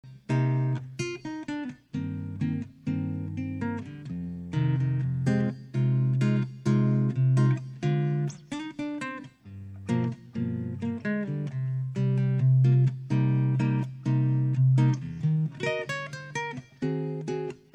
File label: adagio